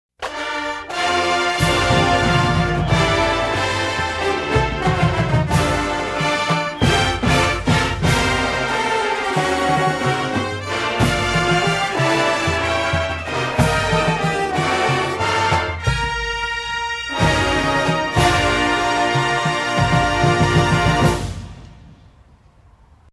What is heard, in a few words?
Fanfare